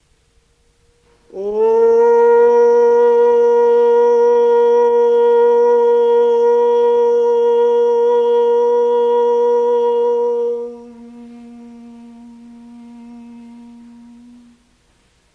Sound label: Opening Aum chant; sri chinmoy sings at jharna kala gallery